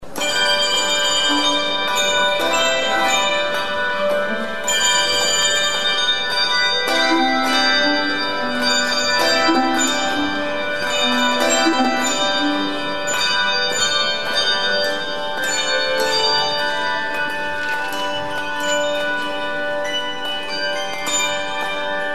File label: Bells Easter